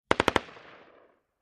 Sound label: M-16 Long Barrel Machine Gun Burst From Distant Point of View